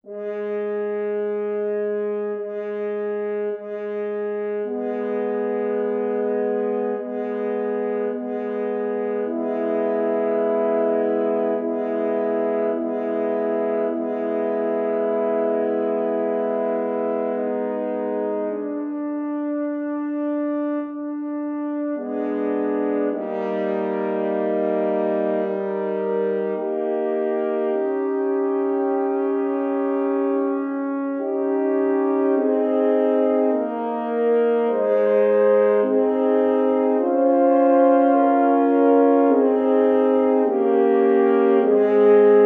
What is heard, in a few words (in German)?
Adagio für Drei Hörner Es Dur; G. Denini